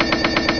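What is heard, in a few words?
cash register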